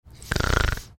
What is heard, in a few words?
making snoring sound